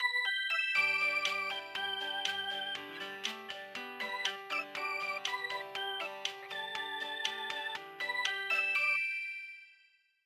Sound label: Radio Jingle (Morning)